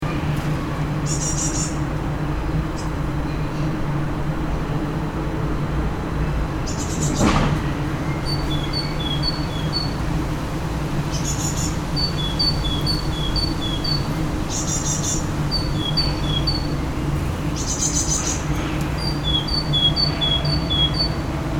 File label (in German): draussen